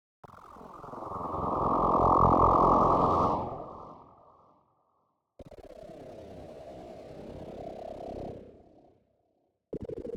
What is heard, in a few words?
Spooky Synth